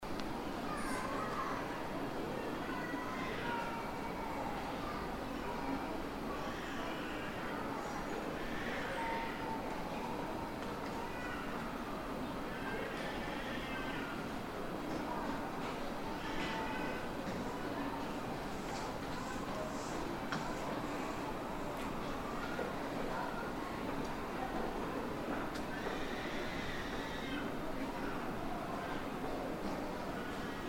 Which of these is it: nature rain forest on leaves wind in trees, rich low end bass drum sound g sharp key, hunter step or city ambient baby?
city ambient baby